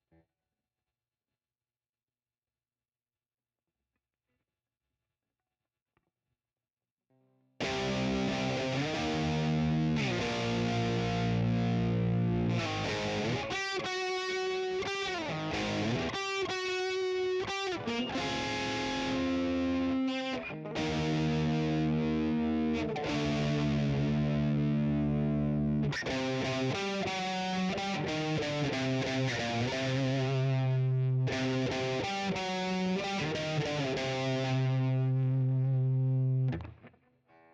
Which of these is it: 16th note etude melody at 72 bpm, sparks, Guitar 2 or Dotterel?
Guitar 2